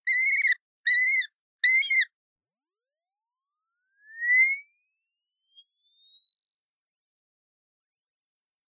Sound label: KITE Yellow Billed, Chirp; The Bird Experiment; Spectrum; Robert's Plugin Corner